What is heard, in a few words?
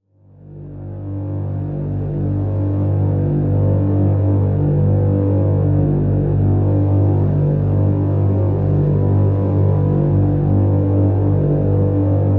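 Drn Dormant G, drone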